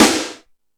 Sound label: Hip House, Drum Machines E-MU Pro-Cussion